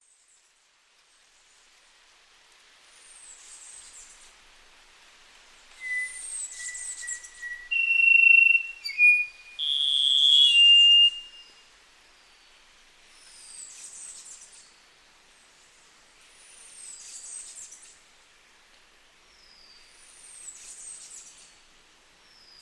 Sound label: rufous throated solitaire